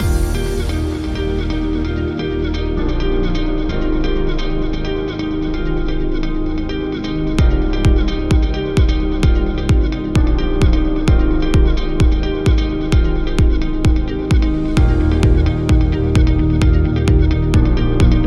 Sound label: Corporate Loop Motivational; service business